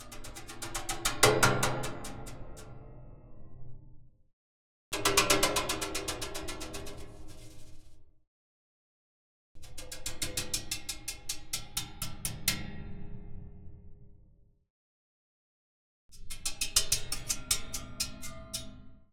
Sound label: Waterphone Multiple Hit Rattle With Water Movement Contact Mic; The Sound Catcher; Liquid Metal